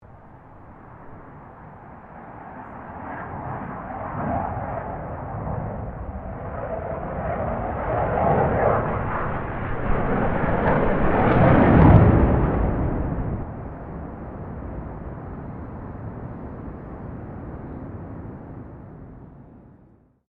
2006 FA-18 HORNET MANEAUVERING; RAAF FA-18 Hornet; Airplanes; Vehicles

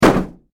Impact Auto Hood Sledge Hammer; Hammers; Tools; SFX Bible 6

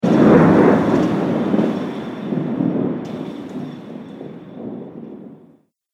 Thunder Strike; Thunder Sound Effects; weather

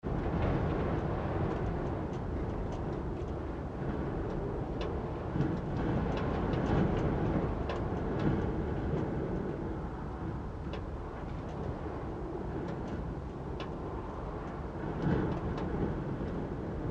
Wind Attic Space Gusts Light; Interior Attic Space; Weather; Rattles; Big Room Sound

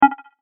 multimedia rollover, Multimedia Rollover Tone; Sound Design; McKinney Sound

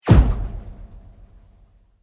Sweep Mechanical Fast; Created sweeps, swooshes and other movement effects; Sweepers; Sound Design

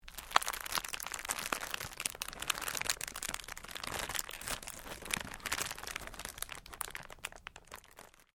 Cellophane crinkle; Material components for constructing more complex sounds; Plastic; Materials

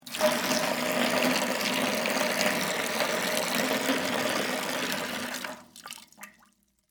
Water Pour Bucket, Into, Metal, Large; Pouring (Sound Effects:Objects); BlastWave

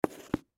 Note Pad Set Down Table; Paper; Office; Blastwave

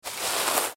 Dirt Scrape Hand Move; Debris; Materials; Foley; Blastwave

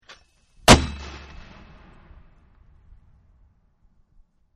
Mortar fire, 3 Inch Mortar Fire; 2 Inch Mortar Fire 1; War; VRSonic

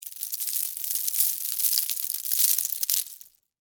Debris Penne Rigate; Texture; BlastWave

